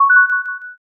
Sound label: m notify